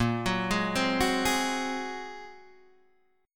Bb 7th Suspended 2nd Sharp 5th Bb Guitar Open D, chord; 8,9,6,6,9,6